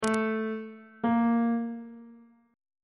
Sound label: a sharp dim8 perf8 intervals on bass clef